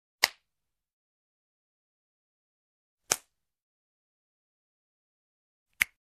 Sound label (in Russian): zvuki shlepka po telu cheloveka shelchok palcami.